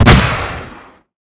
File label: longarm fire